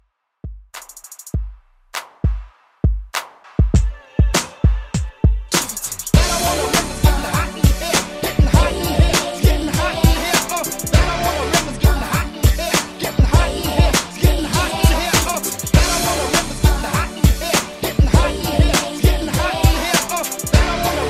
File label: 90s Pop Re Drum 100 BPM